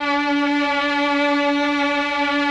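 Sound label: Mellotron strings D3; Greytsounds VOL-1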